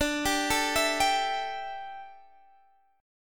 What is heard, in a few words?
D Suspended 2nd Suspended 4th D down Guitar Standard; arpeggiated